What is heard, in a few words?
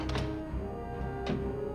Open Hatch Set Aside; trek